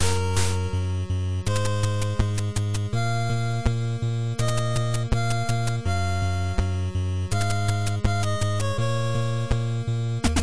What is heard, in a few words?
music opening; quadplay